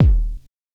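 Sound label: BD bear bass drum, DRUMS; Polyend; Legowelt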